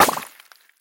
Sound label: spew, infestation, bulb